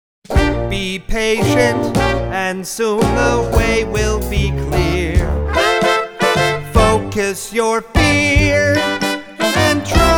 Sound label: But Love Reprise Guided Vocals